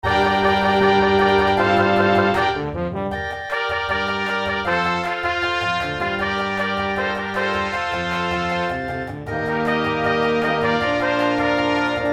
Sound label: Cartoon Intro